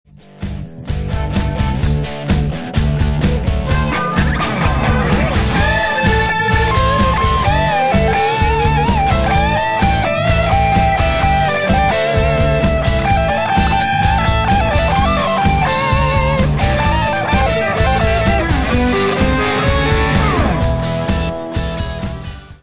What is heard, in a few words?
solo